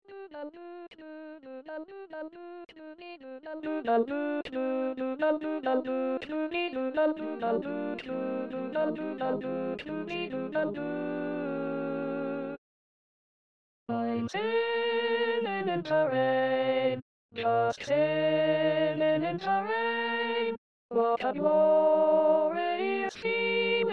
alto SATB Eb

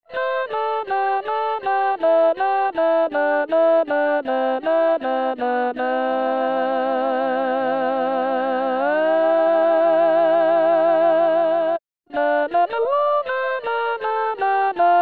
SATB Alto 1

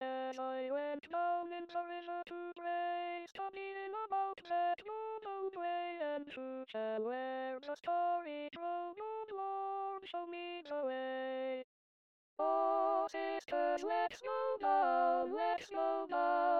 SATB Ten 2

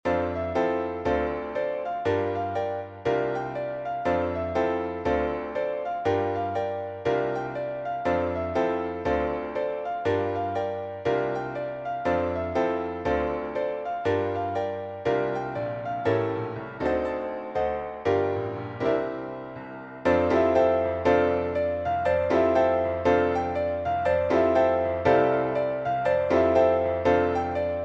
piano part

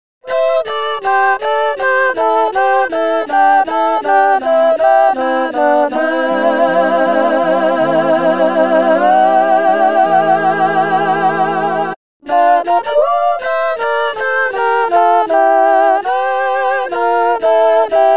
SATB All

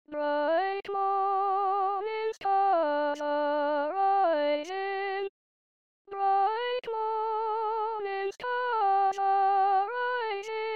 ssa All

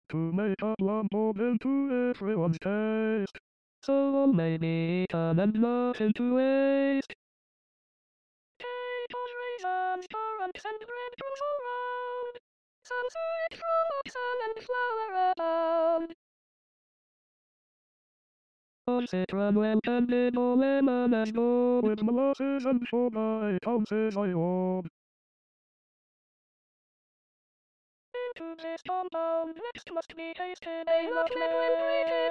with piano All

